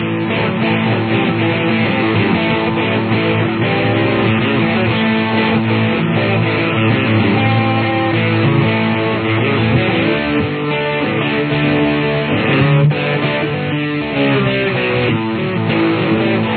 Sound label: full verse